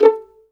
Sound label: STR 2 PIZZ A4, String Vlns Pizz; Roland - String Master Series; Vls Pizz